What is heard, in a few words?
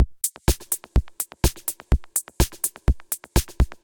Groove, Drum Machines; D55 Klondike; Ableton